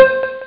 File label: Digi Harp